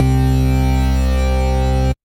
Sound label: Funkwell Lead, Synth Lead; Ableton; Factory Packs; Chop and Swing